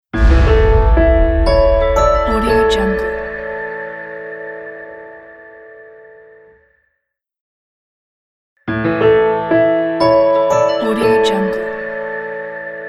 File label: short logo